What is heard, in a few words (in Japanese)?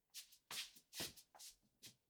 足音正面へ(床); 足音系